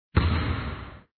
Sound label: hit hard, Basketball; Sports Games; BasketballPractice